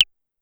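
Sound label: Claves 808 Down, Drum Machines Clavia DDrum-AT Pitch Envelope; Pitch Env Various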